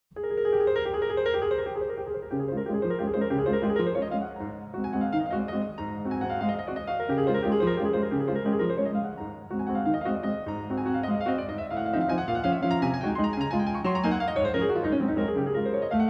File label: Waltzes, In D-Flat; 111 Classical Masterpieces